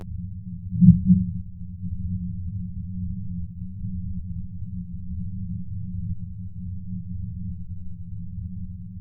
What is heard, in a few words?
thunder denoise